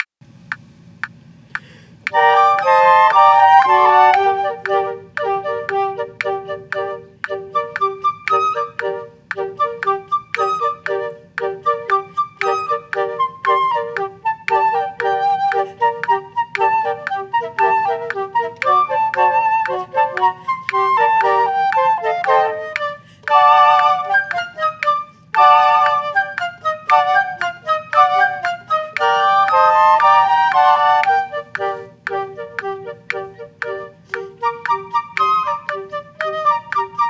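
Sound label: quartet with percussion; the barber of seville; rossini; flexability classics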